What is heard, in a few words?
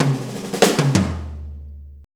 90 BREAK, DRUMS